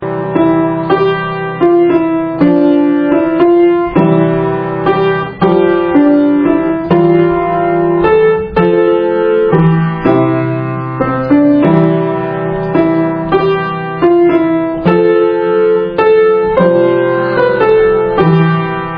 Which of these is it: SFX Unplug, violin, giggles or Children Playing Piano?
Children Playing Piano